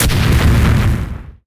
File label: explode; cow mangler